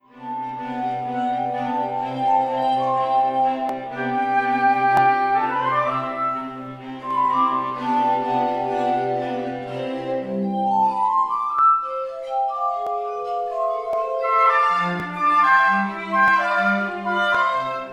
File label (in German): Quintett Rondo Schluss; Mozart